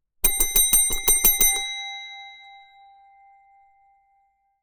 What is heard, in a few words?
Multiple impatient rings on reception desk bell